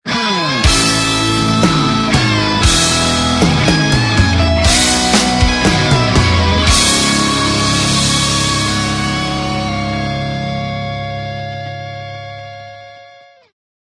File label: Slow Fanfare; gamerip; The Muscle Hustle; Android, iOS